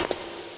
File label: step; Metal Gear Solid; gamesoundopedia